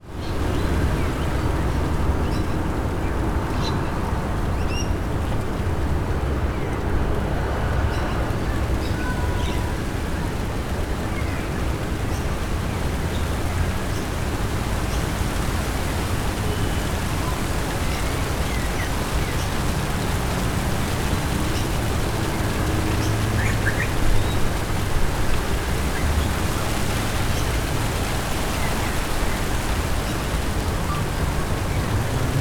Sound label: ambience suburban australia south qld birds wind traffic light rain; zapsplat